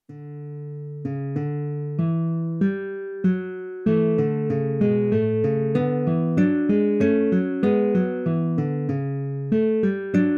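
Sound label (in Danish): Påskeblomst, orgelkoraler; Orgelkoral for manual med pedal over Carl Nielsens melodi fra 1910; Påskeblomst, hvad vil du her; Orgelkoraler til gudstjenestebrug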